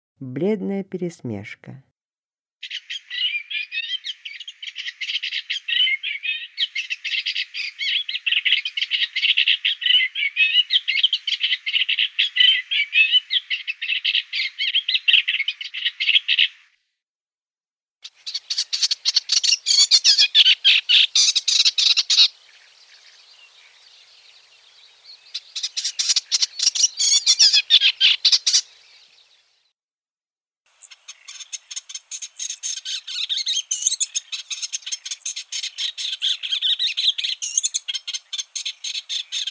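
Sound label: blednaya peresmeshka golos, pale mockingbird voice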